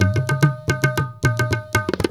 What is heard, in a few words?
India, Heart of Asia